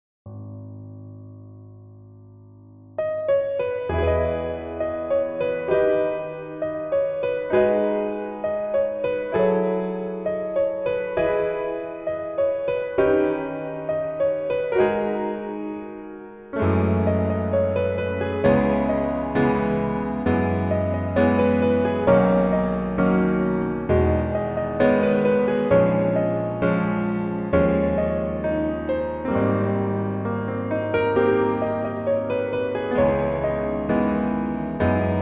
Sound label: Piano